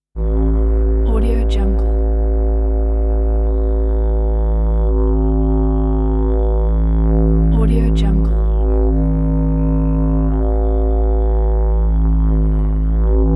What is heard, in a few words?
didgeridoo